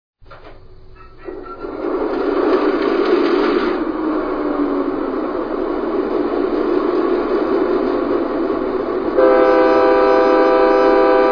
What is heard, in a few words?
TrnMaster